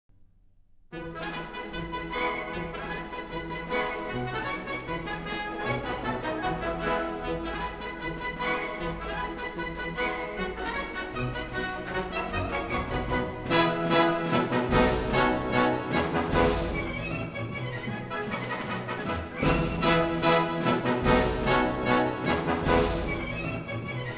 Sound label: allegro vivo; Offenbach